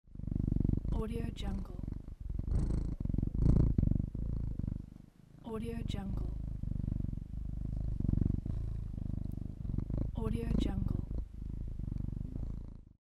purr cat

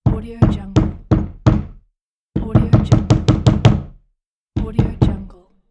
the knocking door

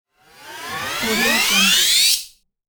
short riser

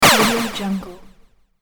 laser echo

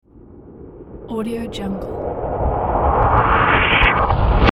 epic reverse fade in effect; hollywoodedge

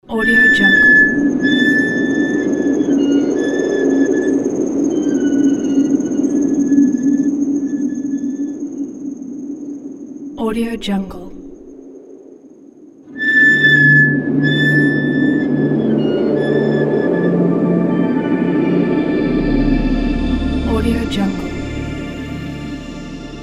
wind creak insects logo

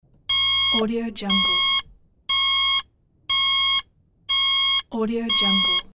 alarm clock

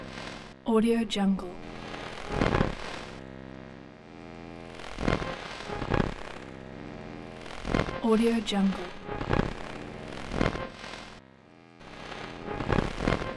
static; umcsound

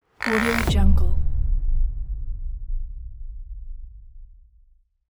creaking distant impact

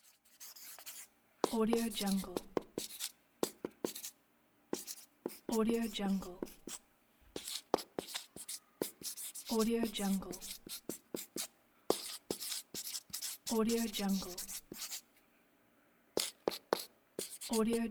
writing with marker on board

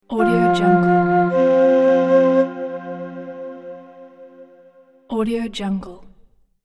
native american flute

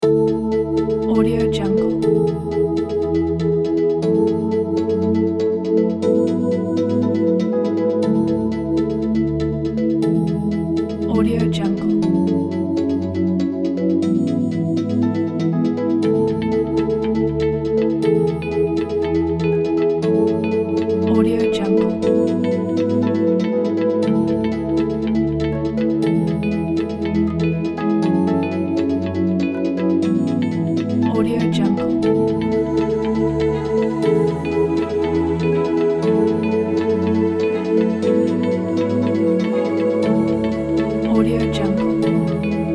discovery travel inspiration pack

